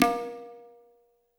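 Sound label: ambience industrial, ethnic percussion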